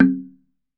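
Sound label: TUNG LO, Tongue Drums, Pitched Percussion; E-MU Producer Series Vol. 7 – Old World Instruments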